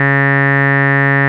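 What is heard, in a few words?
OB8 organ F#1, OBERHEIM OB8; Big Fish Audio - First Call Vintage Keyboards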